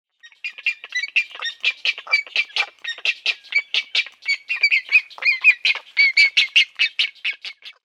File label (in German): Silberreiher Casmerodius albus; Vogelstimmen